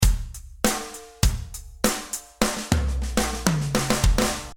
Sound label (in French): fill intermédiaire